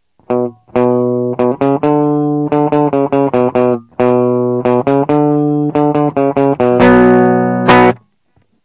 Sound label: clean